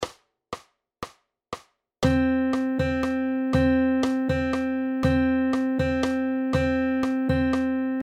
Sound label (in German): Punktierte Viertel